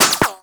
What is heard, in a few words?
Bullet Impact Metal; Warframe; Weapons; Bullet Impacts